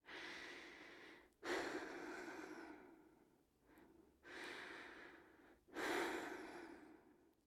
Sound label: female breathe soft